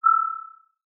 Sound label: Sound of an owl